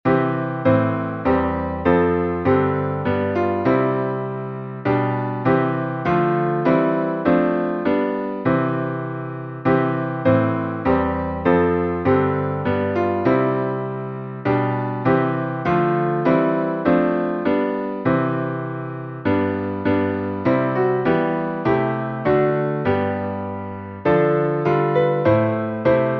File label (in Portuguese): salmo instrumental